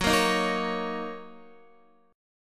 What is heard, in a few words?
Fsharp 6th down Guitar; F#6; strummed